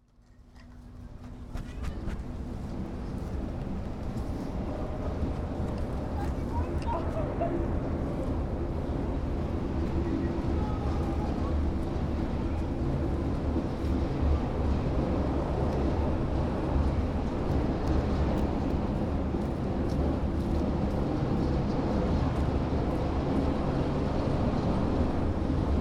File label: Convoy of Snowplows in Harlem; Coley